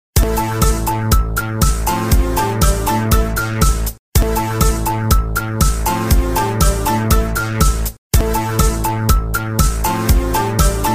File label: ringtones beat plucker; samsung android